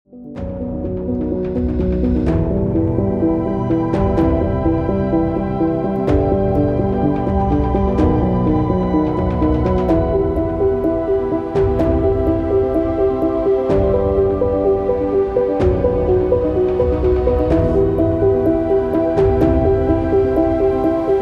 inspiration dramatic piano

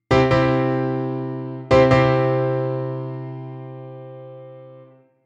game winning piano

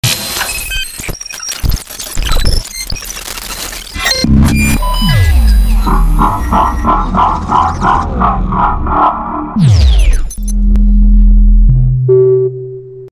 hacking alert; welcome to the game

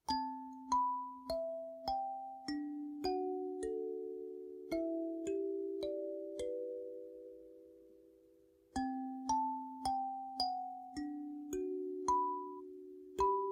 playing with my kalimba; naruto

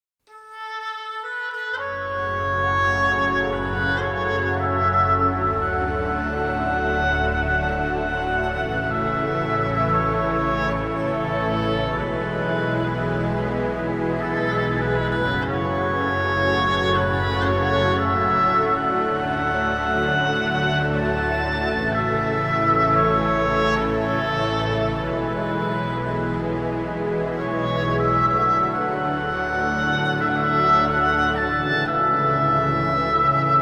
oboe cover

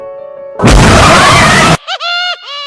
scary screamer; It

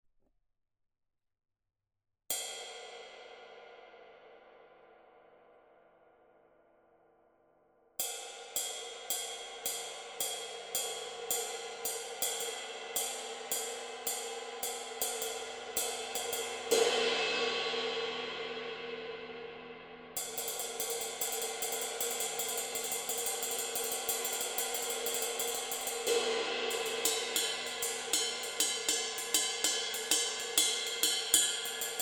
Zildjian K Dark Medium Ride 22

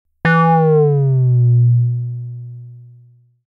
8 Bit Game Over Sound

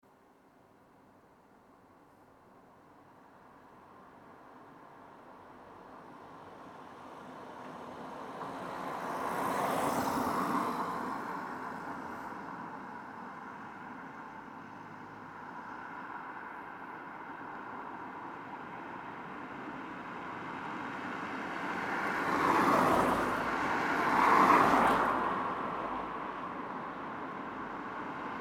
city road at night ambiance

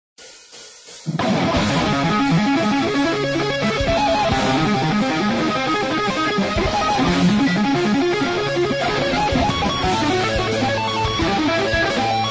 Guitar Cover; Moonlight Sonata 3rd Movement

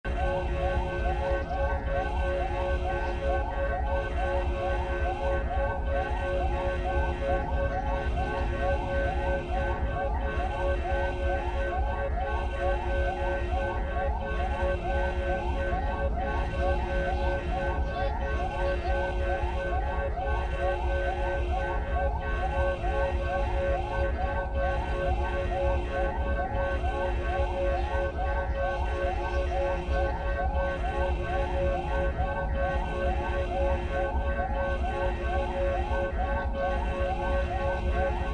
siren alarm ambience; alien isolation

